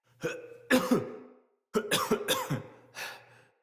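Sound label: Cough Walk, Barks; Battle; Envoy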